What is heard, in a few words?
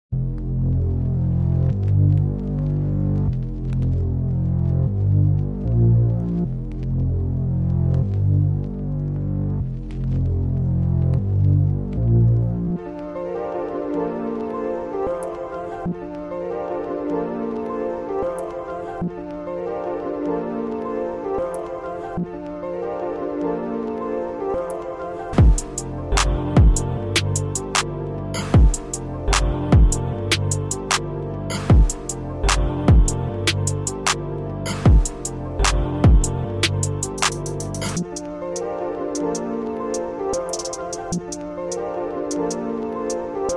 Instrumental; ReProd. by Qhris Legend